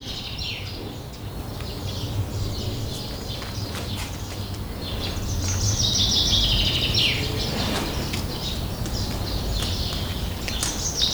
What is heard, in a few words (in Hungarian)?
halk szuszogás nagyon erős veréb csicsergés, örvös medve; debreceni zoo 2012; marantz puska